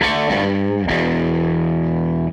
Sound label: Gretsch Guitar Loop